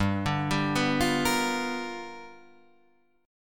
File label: G 7th Sharp 9th G chord, Guitar Open D